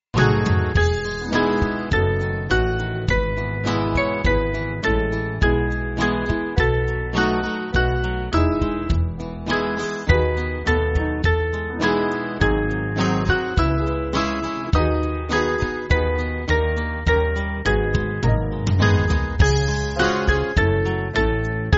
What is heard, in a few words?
I've Found A Friend, band